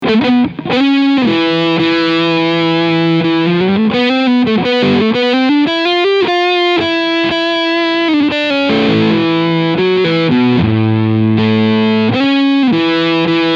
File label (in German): Overdrive beide PUs